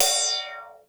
Ride, Korg DSS-1, Drum Machines

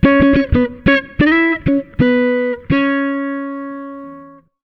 FK SOLO, Jazzistic; FNK

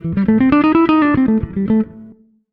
SOLO

